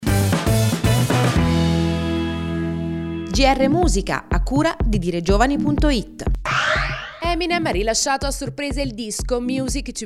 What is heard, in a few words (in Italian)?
musica